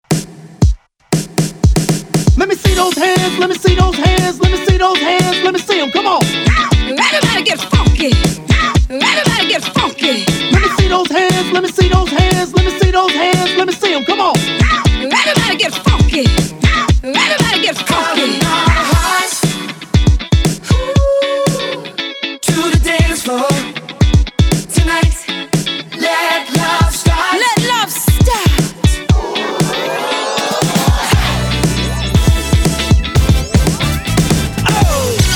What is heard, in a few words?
classic hype party redrum